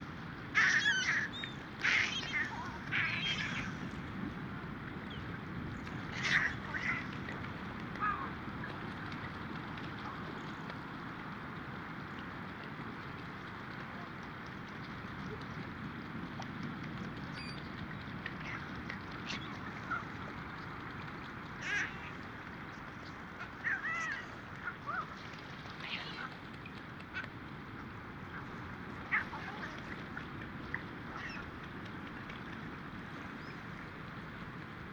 Mediterranean Gull Calls Of First Winters And Adults